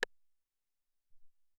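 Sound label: Tek Stick, Alesis SR-18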